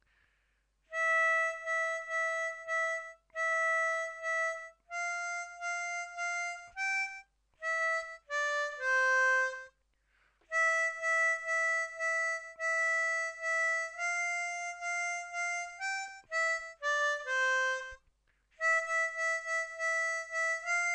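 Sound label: Verse Lead, harmonica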